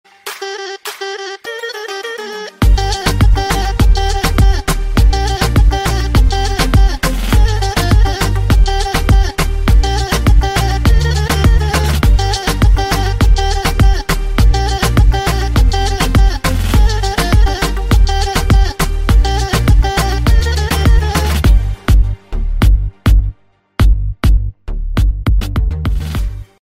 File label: arabic remix ringtone; cool